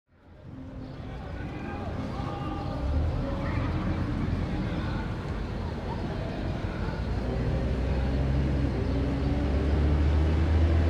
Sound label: City Place large crowd music birds and activity from a rooftop, Chile